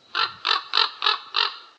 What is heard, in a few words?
Small ambient crow; STALZONE